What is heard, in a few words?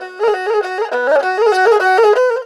ERHU LICKS